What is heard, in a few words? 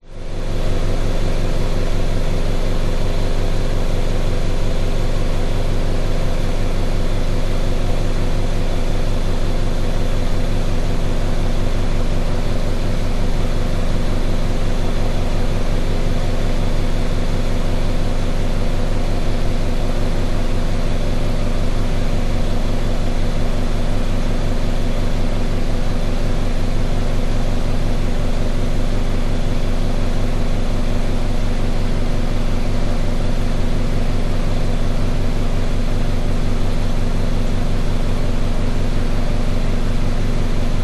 public places public toilet extractor fan old noisy and fan blowing summer hot; australia